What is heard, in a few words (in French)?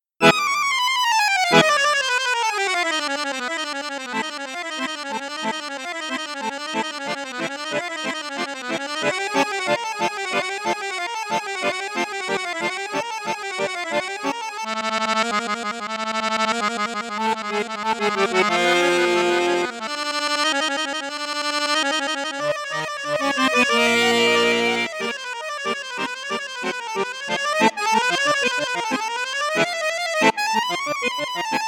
registre flute juste piccolo, accordeon; cavagnolo professionnel